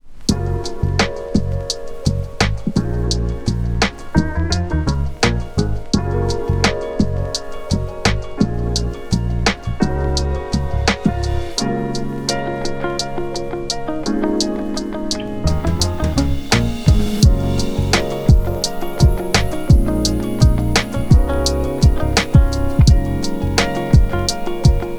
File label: Smoothjazz